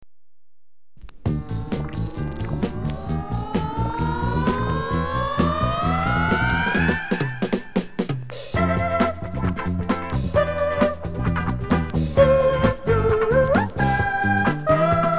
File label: salsa